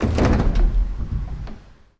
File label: button click